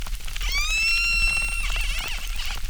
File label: Chirp, acquarium